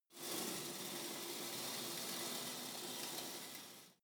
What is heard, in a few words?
pullup machine cable release soft; sport freizeit; fitnessstudio